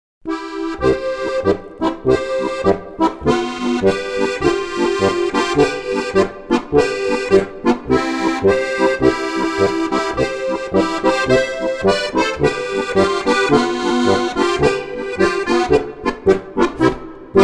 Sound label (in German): polka